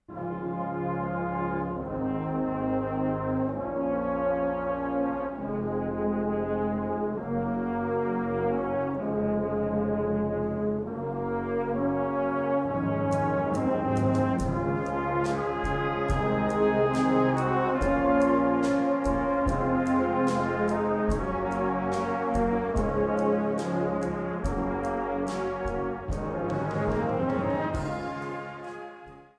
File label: Pachelbels canon, brass band